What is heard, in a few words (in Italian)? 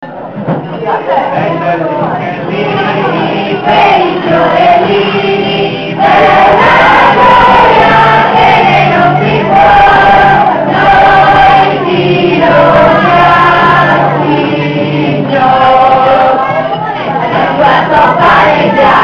per gli uccellini per questo pane bianco